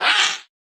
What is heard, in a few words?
Attack